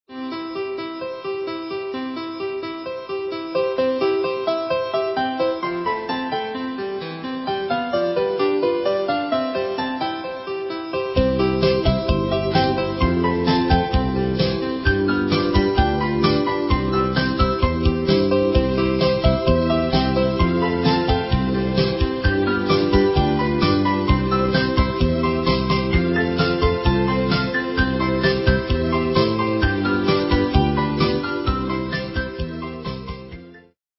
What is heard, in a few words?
music box